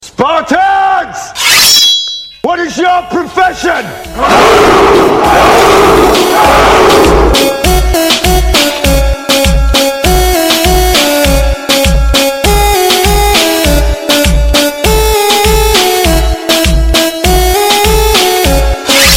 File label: Sparta Hoo Hoo